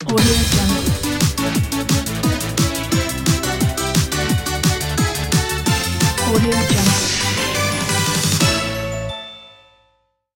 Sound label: winning ident